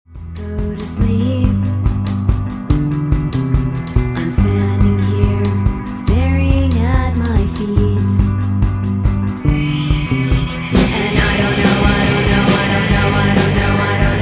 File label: drum